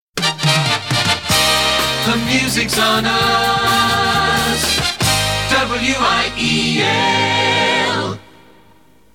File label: jingle